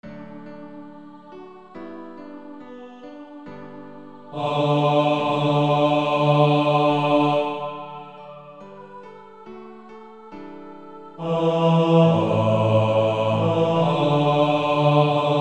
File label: Male Choir B2; Somebodys Knocking at Your Door